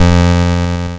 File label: bass F2, LSDJ Notes; LIMAT LSDJ kit